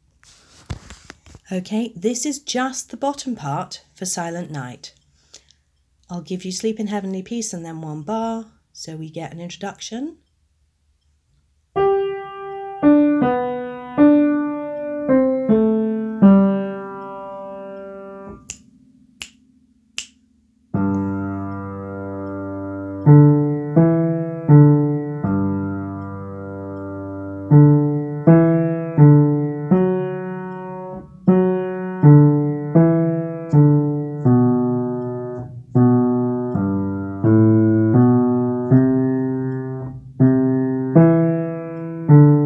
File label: silent night second part only, cello